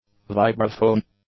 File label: vibraphone; nouns